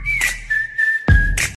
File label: Whistle Short